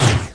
clicky things, Clicky Gadget Things

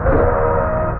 darkworld, Synth

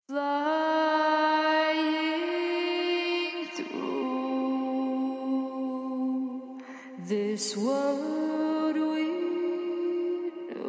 Hook, VOCAL ACAPELLAS

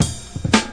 reggae break, fills and rolls

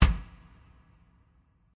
Kick Cold, DrumKit; REAL LIVE KITS; Osama Qureshi DrumKit

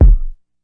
classic hip hop kick; Classic Hip Hop Mega Kit

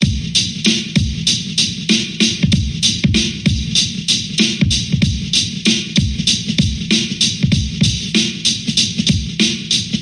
096 way too bright breaker, DRUM LOOPS and BREAKS; 091 to 100 bpm

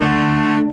orchestra string hit D

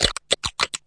chewy crunchy clicky; Weird and Interesting Hits; single hits

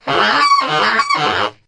wild sax; HORNS SAX TRUMPET BRASS

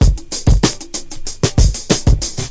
funki dirty, drum loops and breaks, 96 bpm; 091 to 100 bpm

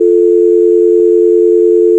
dial tone; Phone Tones

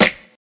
snare

